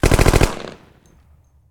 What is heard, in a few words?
Gun, Machine, Sub Machine, Short Burst; gun and weapon sounds; captain audio; fully loaded